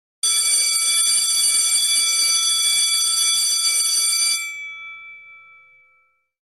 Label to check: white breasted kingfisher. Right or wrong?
Wrong